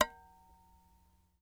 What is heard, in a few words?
Clay Pot harmonic mute, World Percussion One Shots; Sony CS